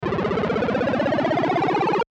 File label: Video Game Sequence Ascend; Low; Blastwave